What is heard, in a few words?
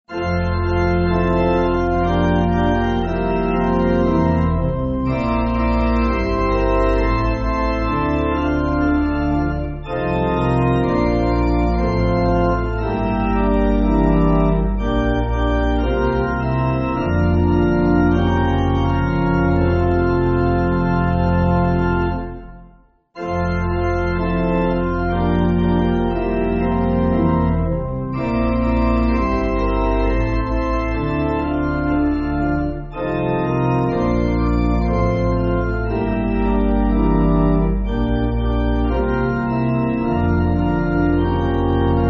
Pipe Organ, Music Backing Files for Churches; Beharka Pál